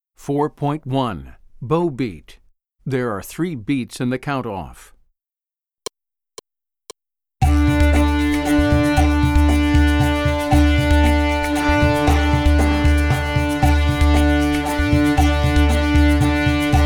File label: Bow Beat